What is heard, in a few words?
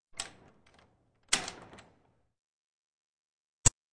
Track, Doors; 헐리웃특수효과음; 문 소리